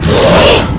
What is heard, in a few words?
bloodsucker attack